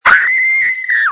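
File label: Scream, phone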